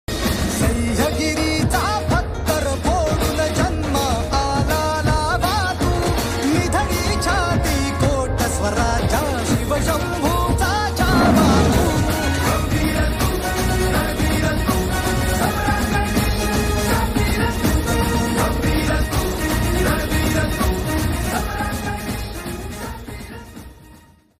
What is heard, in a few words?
ringtone; chatrapati shivaji maharaj; veer shivaji ringtone; shambhuraje